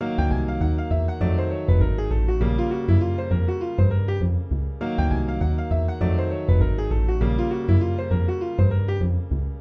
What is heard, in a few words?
ii-v7 combinations